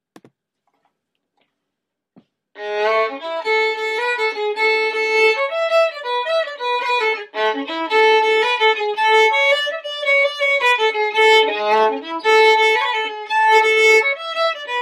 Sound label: jig